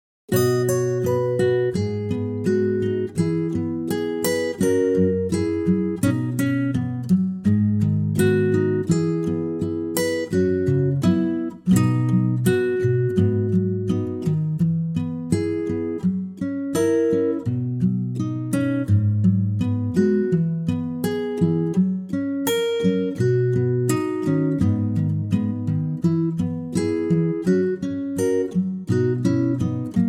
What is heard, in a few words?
Ac Gtr B; key - B; vocal range - F# to A#; Gorgeous acoustic guitar arrangement